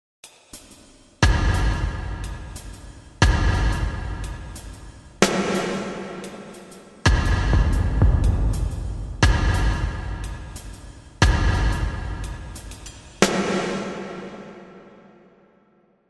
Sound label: NICE SNARE DUB